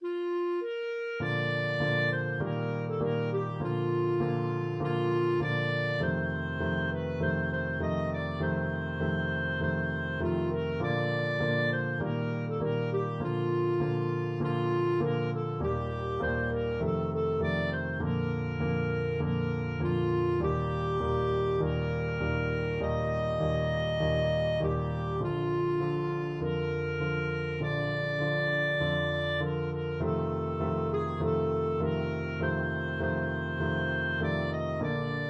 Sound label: aloha Oe, clarinet